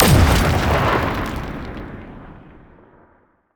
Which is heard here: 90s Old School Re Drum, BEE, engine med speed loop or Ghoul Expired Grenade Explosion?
Ghoul Expired Grenade Explosion